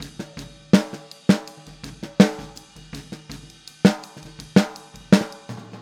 Boogaloo Chorus Snare, 82 BPM

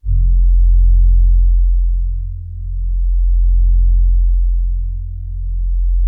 SOFT TRIX F1, SOFT BRASS; Music Store - Claudius Diemeter Schwerlos

